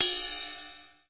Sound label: ride bell